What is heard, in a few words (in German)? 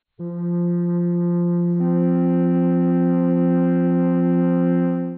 flöte f1 c2; Intervalle, die man durch Potenzen von 2 und 3 darstellen kann